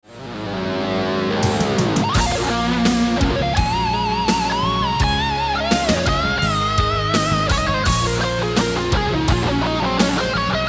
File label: sweep